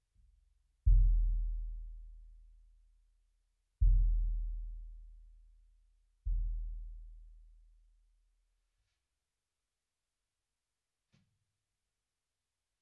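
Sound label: Bass Drum In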